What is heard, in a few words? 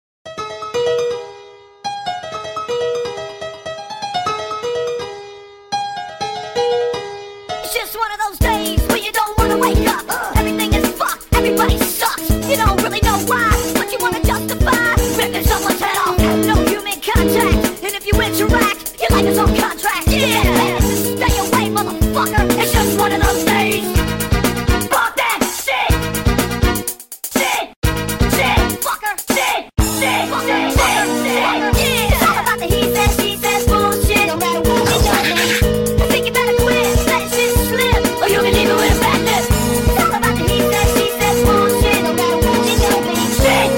piano car